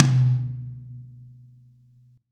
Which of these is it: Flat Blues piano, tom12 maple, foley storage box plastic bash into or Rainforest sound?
tom12 maple